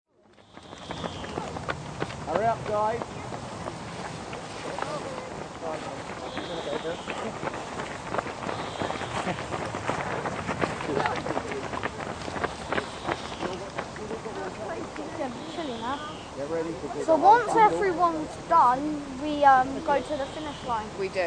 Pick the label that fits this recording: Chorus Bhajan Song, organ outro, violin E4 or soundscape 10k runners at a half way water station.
soundscape 10k runners at a half way water station